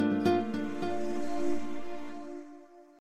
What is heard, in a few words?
Chime